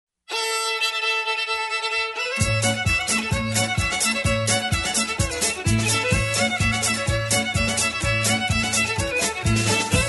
black mountain rag, Instrumental TRacks, square dance music